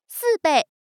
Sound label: Chip